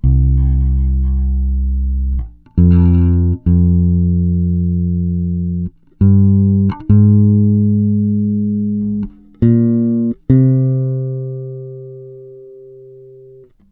PARIS 70, Bass Grooves; USB Soundscan vol.30; AKAI

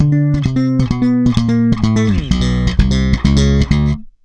FILL, Bass, 130 BPM; Sampleheads; Ultra Freakin' Bass

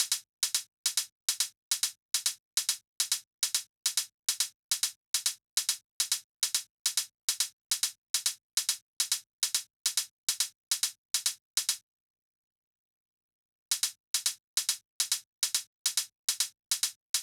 drum Loop 140BPM HH

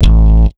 FX, Big Beat Drumloops; PHASE 130; USB Soundscan vol.25; AKAI

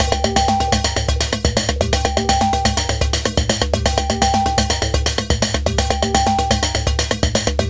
Sine Wave, Processed Percussion

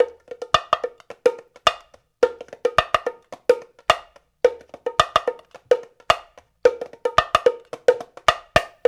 Tonga HipHop

